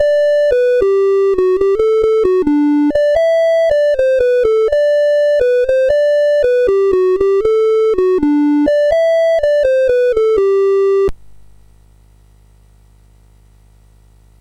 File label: Dog, CSG8580R5